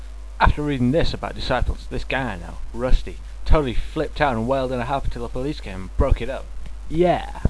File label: spoon rusty harp